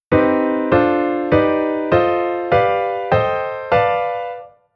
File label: Chord Diatonic Minor Melo Min